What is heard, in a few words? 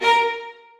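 2nd violins staccato a#3; Sonatina Symphonic Orchestra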